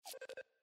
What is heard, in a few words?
menu text rollover